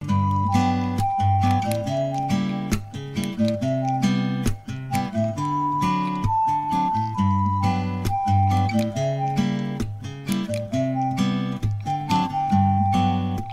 ocarina

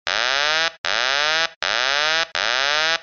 737 alarm

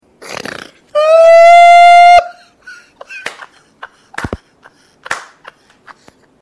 laugh

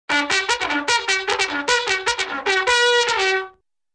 sour bugle reveille

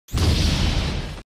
strong punch; one piece

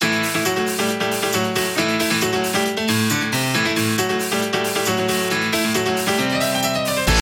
anime battle theme

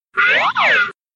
cartoon jumped

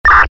fx police beep

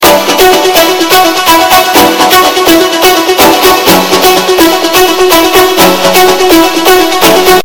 bass boost

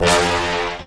horn

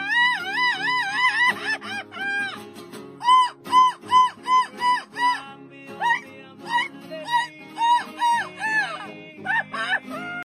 el grito

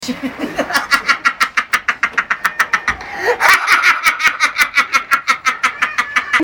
evil laugh

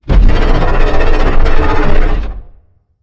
broodmother roar; xiru

